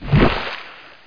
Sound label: Muck exit; Heretic II; Player